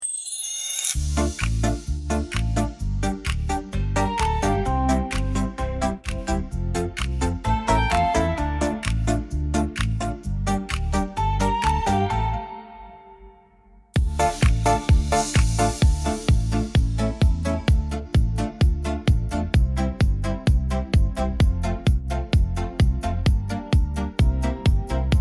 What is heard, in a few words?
Instrumental